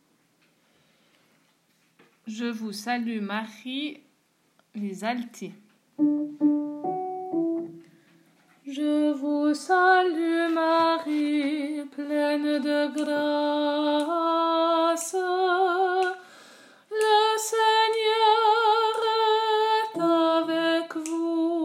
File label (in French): Je Vous Salue Marie Alto